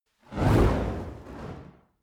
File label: fire torch whoosh long; swooshes-swishes